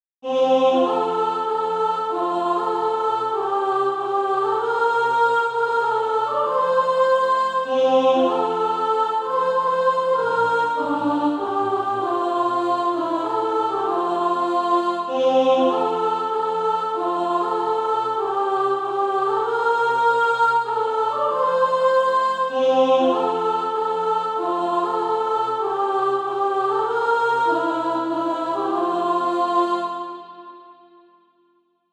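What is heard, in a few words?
soprano, Practise Music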